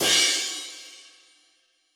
Crash Cymbal

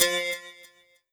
Korg MS2000 Electro Koto C5

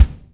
Korg TR Rack Standard Kit Bass Drum

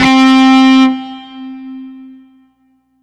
Korg N1R Tube Crunch C4

